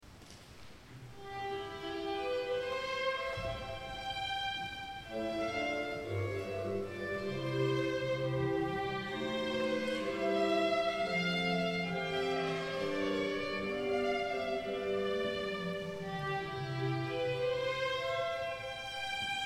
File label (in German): Instrumental 2 Volkslied; Konzert 190 Jahre